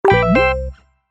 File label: Double Chance Powerup; Trivia Crack; Android